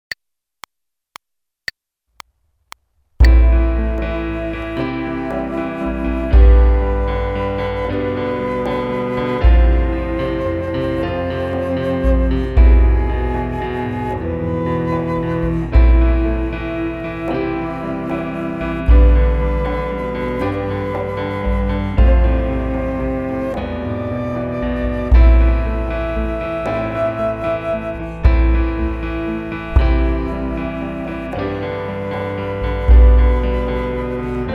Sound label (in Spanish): Tercera voz Flauta